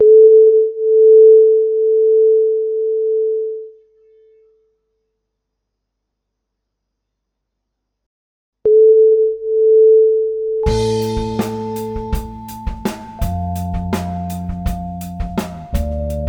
Pulse metro

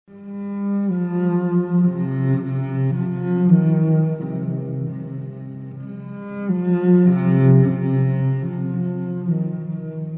duo cellos

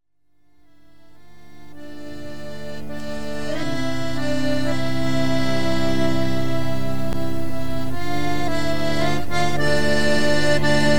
Acordeon

